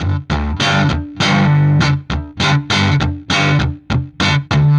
Low Down Guitar; Guitars

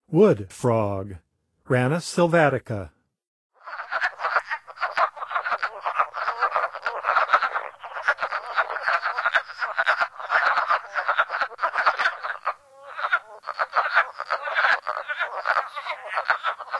Wood Frog; North America; Songs of Frogs & Toads of North America

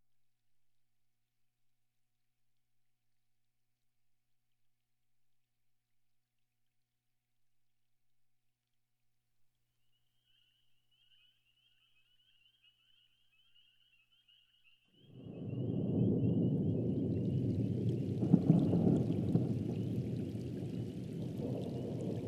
Relaxing Thunderstorms, Nature Sounds; World Music; sounds of Nature; Tranquil Moods

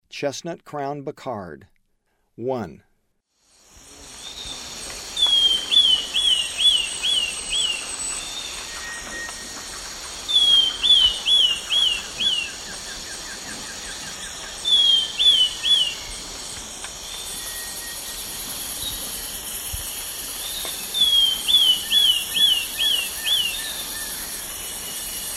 Chestnut-crowned Becard; S. America; Eastern Lowlands

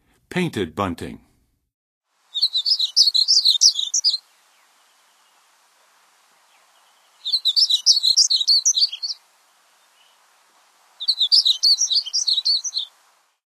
Painted Bunting, Songs of Birds of North America; Western USA; Western USA Songbirds

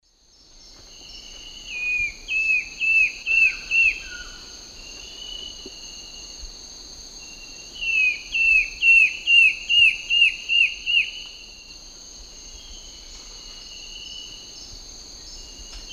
Schistocichla schistacea, song and duet; S. America; Eastern Lowlands

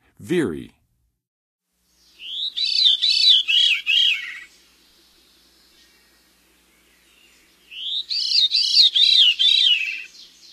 Veery, Songs of Birds; North America; Western USA; Western USA Songbirds

